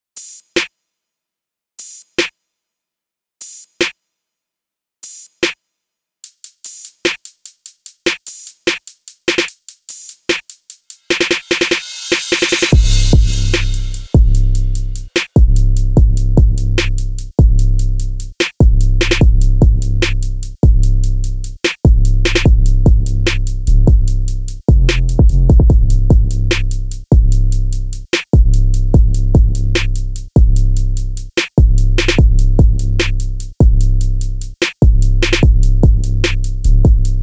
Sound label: Beat